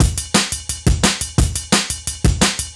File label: DNB BREAK; m8 breaks